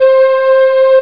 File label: Metal Nai; Prince of Persia 2 Demo